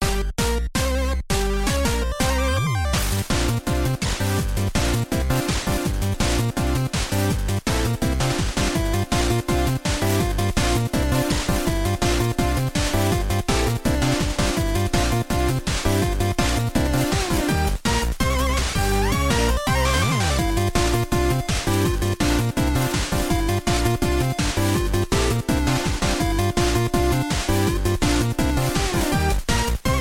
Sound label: 8-bit